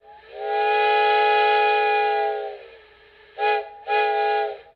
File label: whistle, locomotive steam; Minecraft; Unleashed; railcraft